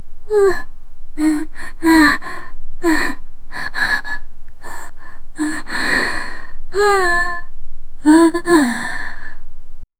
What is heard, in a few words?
anime girl is struggling, invisible